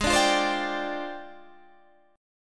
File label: F Minor 6th Add 9th G sharp